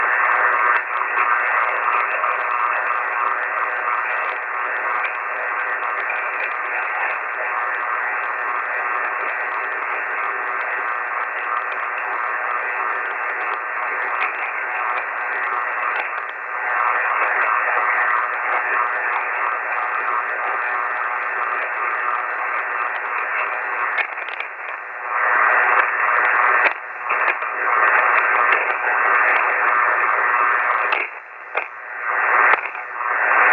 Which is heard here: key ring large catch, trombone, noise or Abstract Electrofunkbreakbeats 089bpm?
noise